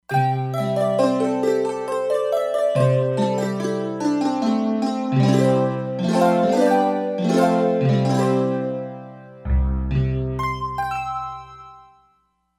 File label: Stereo Harp, E-mu Proteus-3; Factory patches